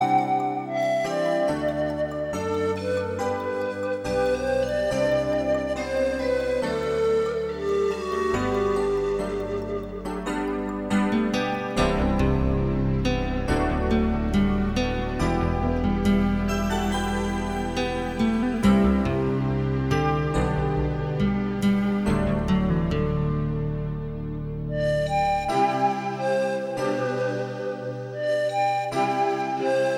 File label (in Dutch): panfluit